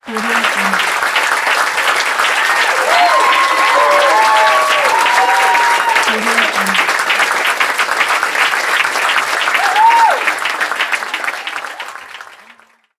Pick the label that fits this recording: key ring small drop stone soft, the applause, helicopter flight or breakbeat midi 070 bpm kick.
the applause